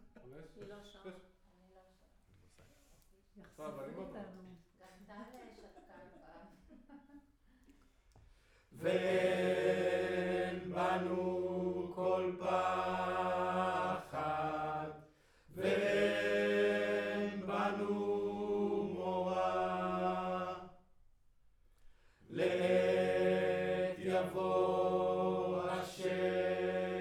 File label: Room, Choir